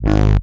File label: Wave Sun, baesse